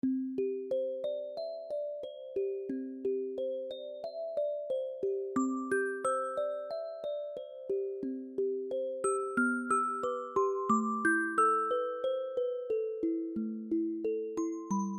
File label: Music Box